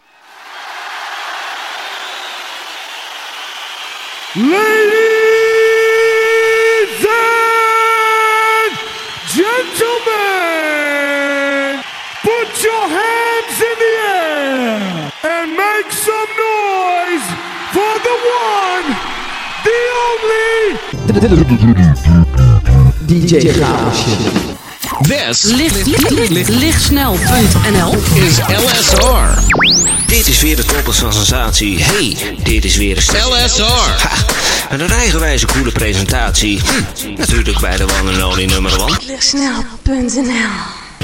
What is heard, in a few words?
intro moonlight beat